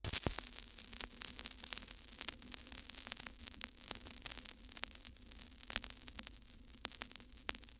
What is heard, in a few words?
Vinyl Crackles